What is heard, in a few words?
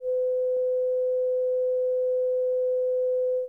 500 sine in phase; Auditory Perception